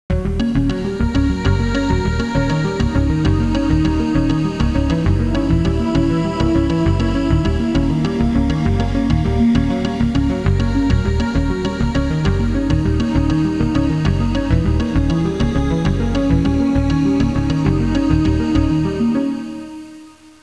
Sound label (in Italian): musica elettronica; electronic